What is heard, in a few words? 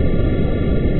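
craft idle loop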